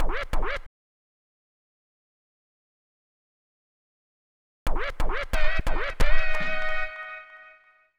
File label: Steel Scratch; Scratching; Zero-G Smoke